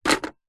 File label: household telephone vintage receiver slam down hang up hard bakelite; zapsplat